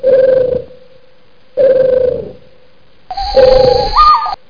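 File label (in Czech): hajovna, gamekeeper's lodge; pavouk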